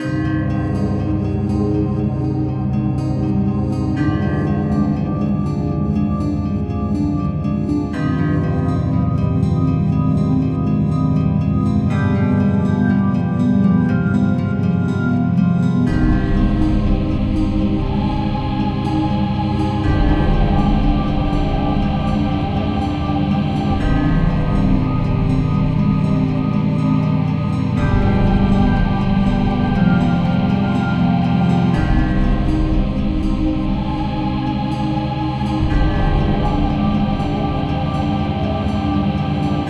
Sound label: Town Music; Hero Siege; Android